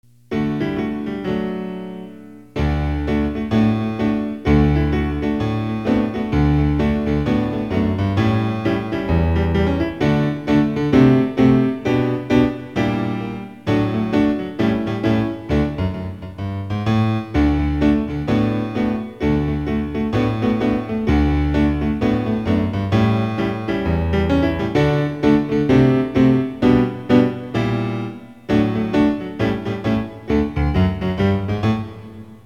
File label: piano; PSE Music; normal tempo